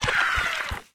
STINKBUG WAR WALK

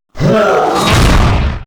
war attack; Mob

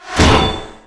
Arrow Metal, Damage

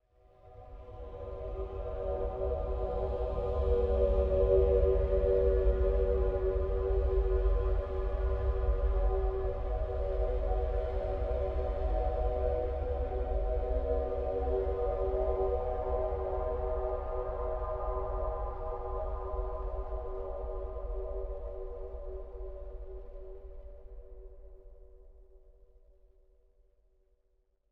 Altar, ambience

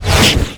Electric Ball

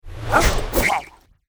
WAR ATTACK

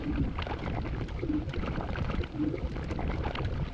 Lava Flow Small, ambience

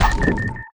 PEACE DAMAGE

